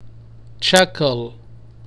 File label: chuckle